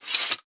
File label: sheathe sword; warrior